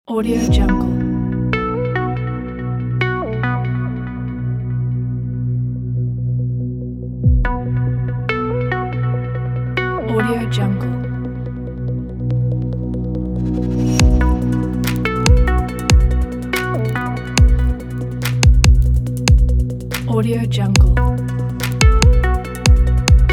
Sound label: timelapse technology